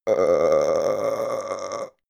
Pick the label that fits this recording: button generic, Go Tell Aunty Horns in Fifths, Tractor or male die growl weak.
male die growl weak